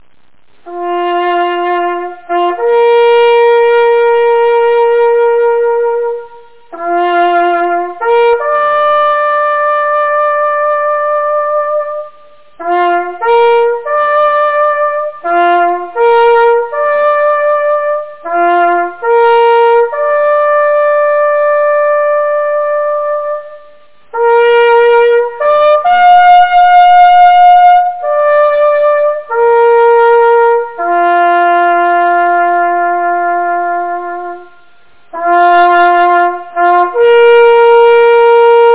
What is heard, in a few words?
last post, jagthorn; Askov Malt Jagtforening